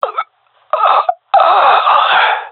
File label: death, male; vo